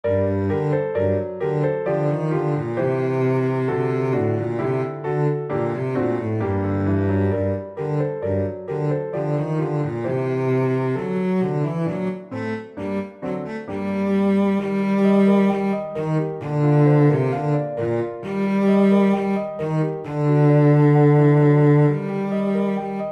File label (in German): Cello Klavier; Violoncello und Klavier; Tonart: g-Moll; Besetzung: Violoncello und Klavier